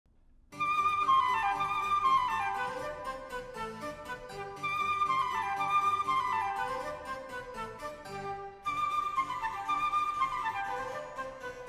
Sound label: flute; Bach Muzette